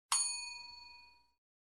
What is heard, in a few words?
timer 90 sec